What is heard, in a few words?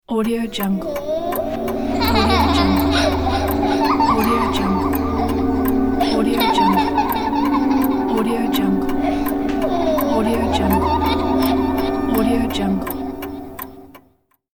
scary laughter